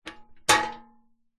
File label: industrial sack truck trolley metal stand upright concrete